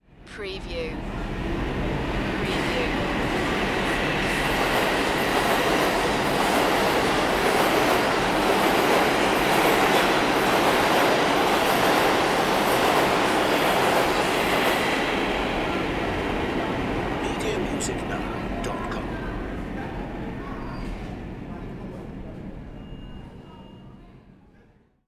Subway Station

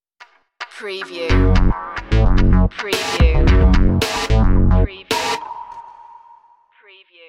80s cop funk stinger

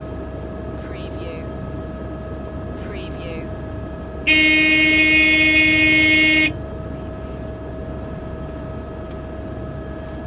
Helicopter Idle Alarm Warning Horn

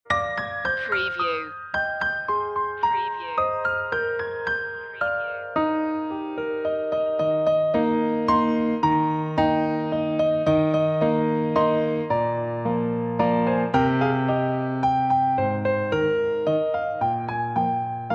piano; the holly and the ivy; media music now

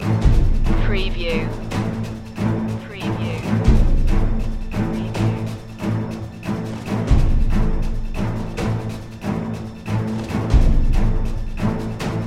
underground chase loop

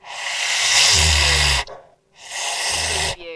Alien Monster Breath

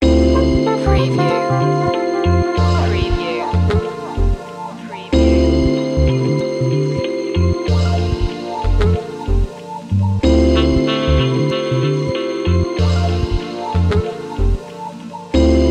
night tales loop

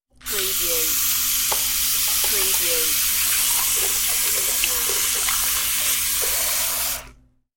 Wash Hands

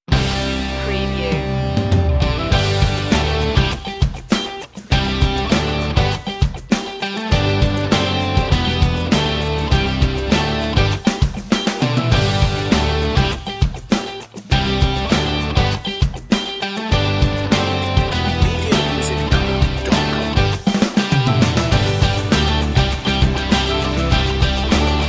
no vocal

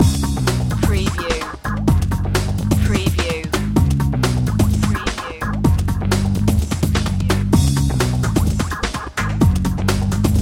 funk loop

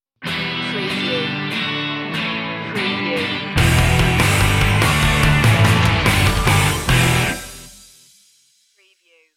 pinkday sting

